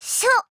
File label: wrench shoot; sunanekodesu